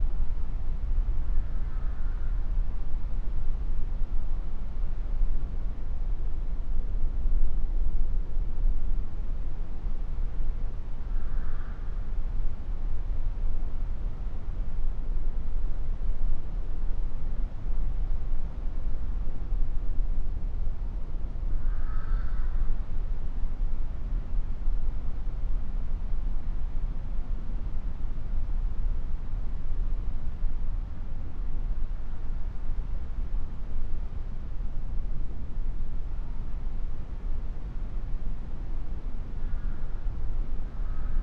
ambience; ysmault

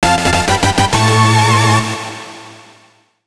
Jingle Congratulations

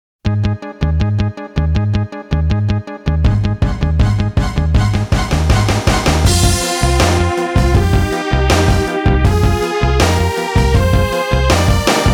frightening melody; Hanjuku Hero vs 3D

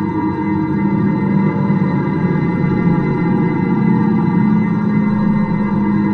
SFX Loop Ghost

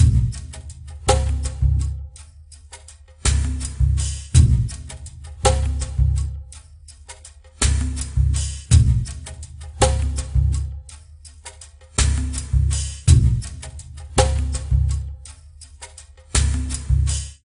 Jungle Level

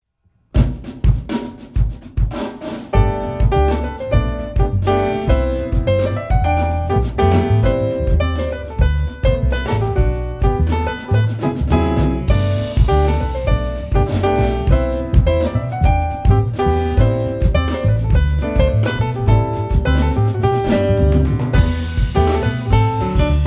Minuet in G major - Funk; Troubleshooter Abandoned Children; Minuet in G major BWV Anh. II 114

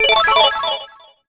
Coin; tetris the grand master; arcade

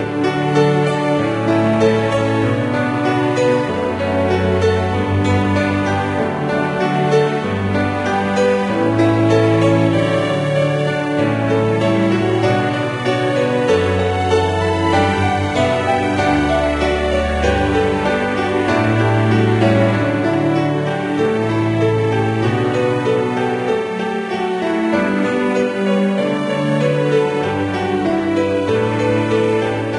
Cannon In D Music

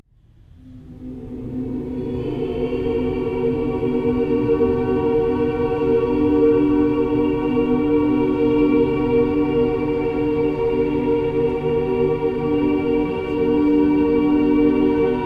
Choir; Outlast 2; PS4 Windows Xbox One

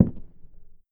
Out Side Foot Step

